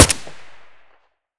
ak74u silencer stereo; STALKER; IWP

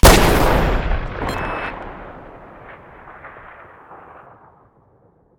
gauss comp shoot stereo last, weapons

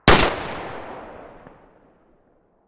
muzzle hpsa mono

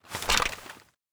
aa12 draw, weapons; STALKER; IWP

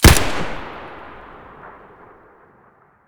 sks muzzle mono, weapons

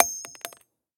Shell heavy carpet; STALKER; IWP

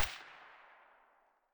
silencer mono far

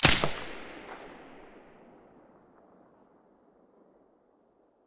silencer stereo, weapons

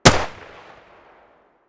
m1911 muzzle mono distant, weapons; colt

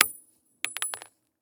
shell 39mm carpet; STALKER; IWP

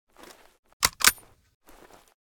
mosin reload start, weapons

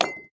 bolt glass, material; STALKER; IWP

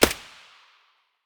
sig550 silencer distant; weapons

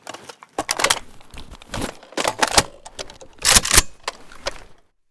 rpk16 reload empty

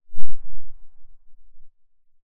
silencer dvl10 very far

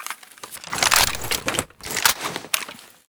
awm reload

